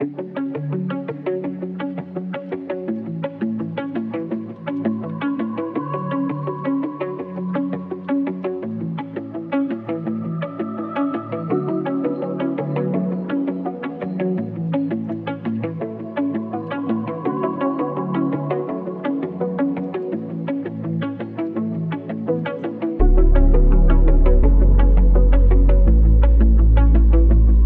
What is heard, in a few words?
167 BPM F# Min, Guitar; Sunset Boulevard; Cymatics; Infinity Guitar Collection